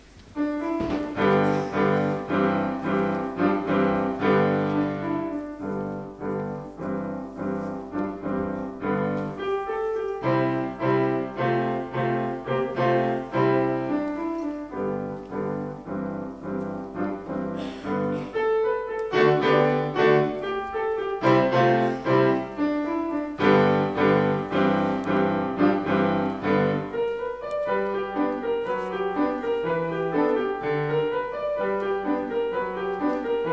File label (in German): Klavier Solo; Jugendkammerchor Ahrensburg